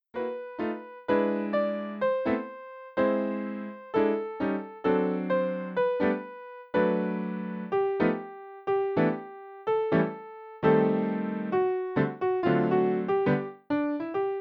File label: Solo; Grun grun grun sind alle meine Kleider; folk and traditional sheet music arranged for solo piano